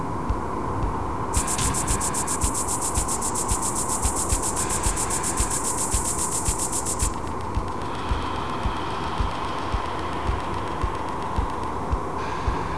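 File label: noise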